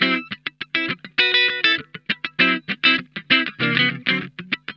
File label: SLINKIN, Funk Guitar; Zero G - Funk Guitar